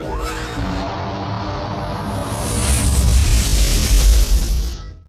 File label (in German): DUESEN; Best Service; Advanced Mega Effects